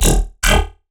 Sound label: Riddim Bass Hit E; Wormhole